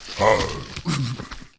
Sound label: zombie attack